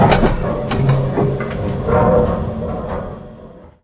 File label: exterior shell door move; Barotrauma; barotrauma ek